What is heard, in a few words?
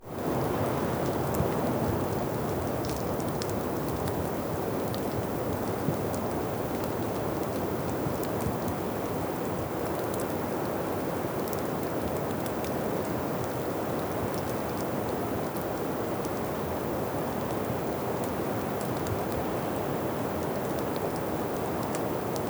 Forest atmosphere